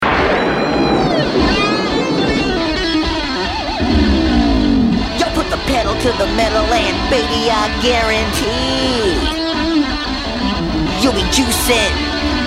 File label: Guitar; Sonic The Hedgehog SatAM Soundtrack and Unreleased Themes